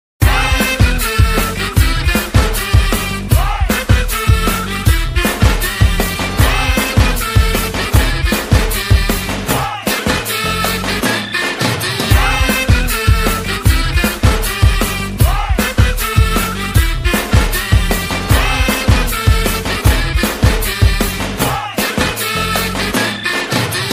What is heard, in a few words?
movie bgm ringtone; ante sundaraniki; nani; nazriya